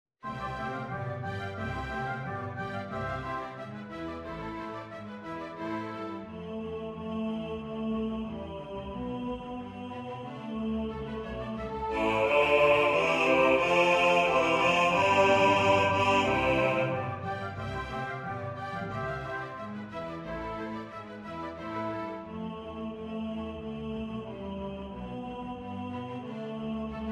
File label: Bass; Stölzel; Te Deum; Du Köng der Ehren